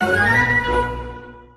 allegro, notifications; Sony Xperia Z2

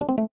normal low battery; OnePlus OxygenOS 13

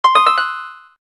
harp, keytone

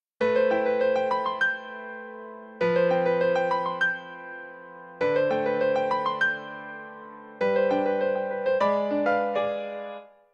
Blue Piano, ringtones; Asus Zenfone-3-Deluxe

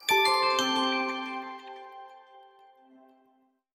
htc boot; HTC Infobar A02

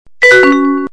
aim msg; LG VS750 Fathum

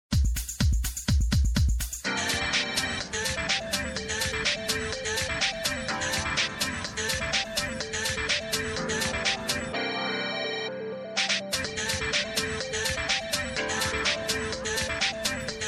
Calling Trip, Ring tones

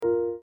Flicking Sound; LG GT350i Wink Plus; System sounds

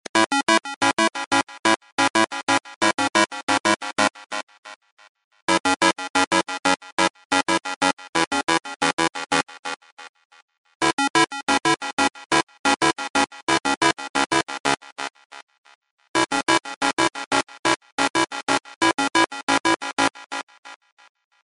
8bit

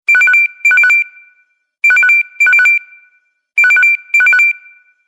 Telephone Ring; LG BL40 New Chocolate

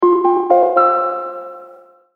Noise; ROKiT IO 3D

